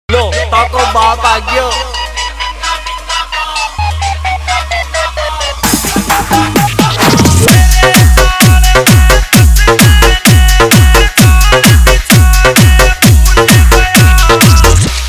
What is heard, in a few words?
Siren Bass Remix Song